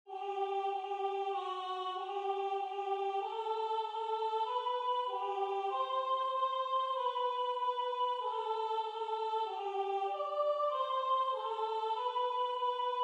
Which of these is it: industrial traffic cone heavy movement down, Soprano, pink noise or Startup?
Soprano